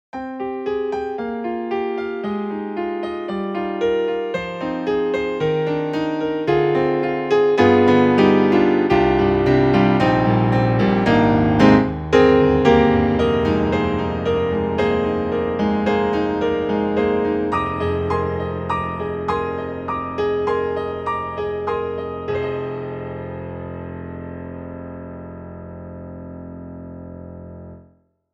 YAMAHA MOTIF-RACK XS Monaural Grand

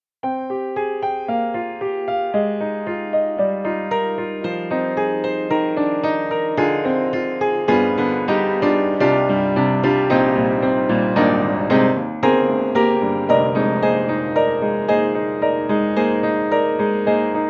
Upright Piano Player; Steinberg; The Grand 3; Flower-Child

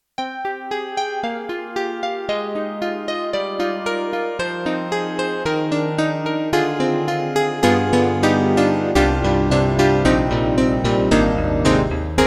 Yamaha MU1000EX Layer CP2

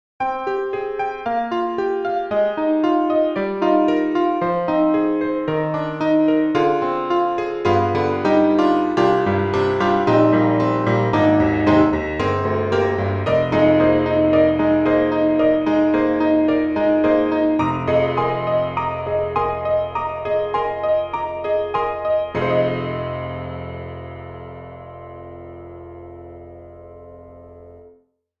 Vintage Piano Dark Room Piano

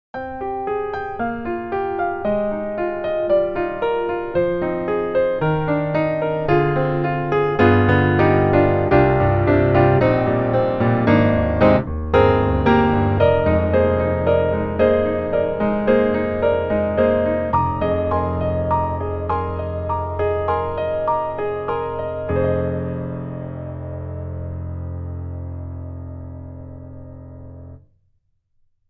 Custom C7 Grand Piano; Spectrasonics; Keyscape

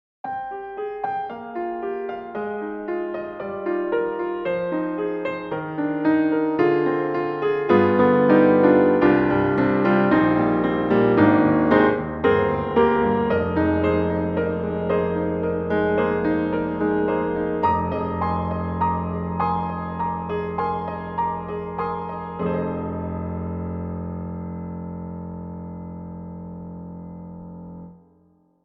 Black Grand Chapel; VI ONE

